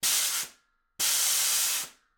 Air Compressor, 5 hp, 20 Gallon, Twin Cylinder, Air Release; Omega